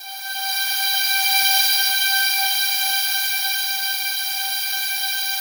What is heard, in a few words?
SLOW STRINGS; Music Store; 90's Sample CDs; Yamaha VP-1; SLOWSTRINGS4